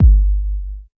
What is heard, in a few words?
deep dance kick; Kicks; single hits